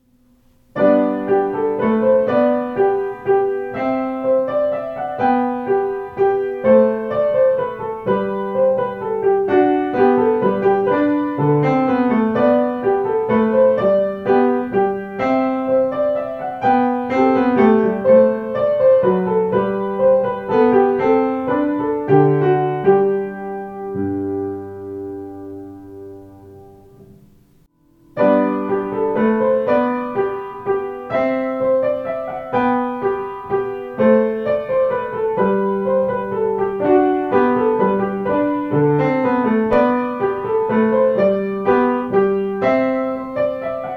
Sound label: Minuet in G Major